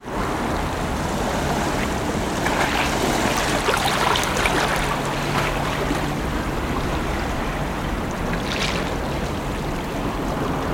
water ocean light waves break harbour wall city ambience drone bg